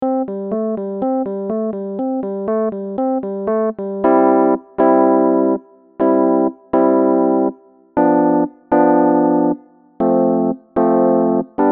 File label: pop song